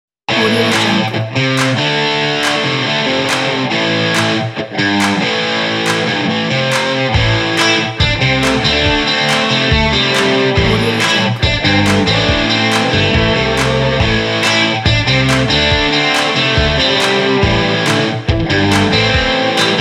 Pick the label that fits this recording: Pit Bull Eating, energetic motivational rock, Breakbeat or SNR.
energetic motivational rock